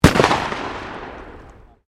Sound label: SHOTGUN 12 GAUGE DOUBLE BARREL FIRING, Sporting Shotguns